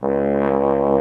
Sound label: VIBRATO TROM